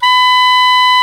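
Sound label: Soft Soprano E3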